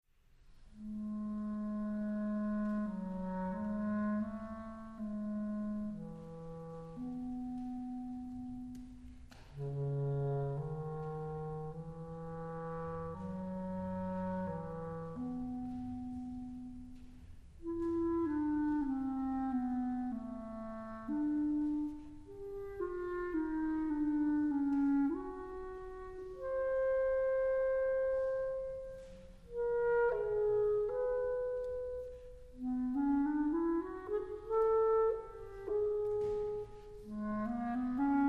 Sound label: Clarinet Trio